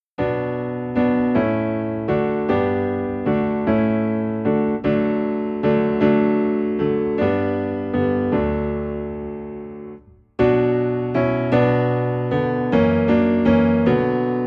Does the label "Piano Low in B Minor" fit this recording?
Yes